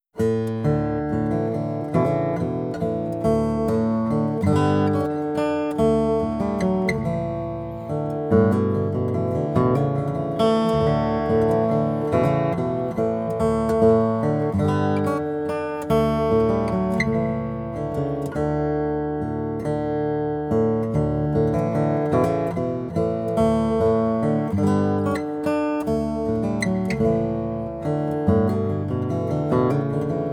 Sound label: Baritone Gtr